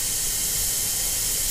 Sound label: gas loop, ambient machines; Source Engine; cstrike